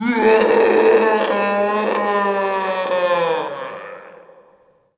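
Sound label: tiki scream; hawaii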